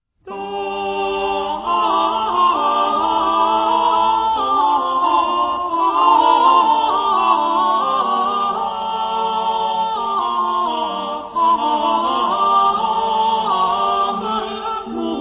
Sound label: medieval french song; guillaume de machaut; dame mon cuer en vous remaint